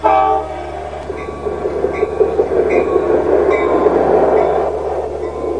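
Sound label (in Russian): поезд начинает движение после гудка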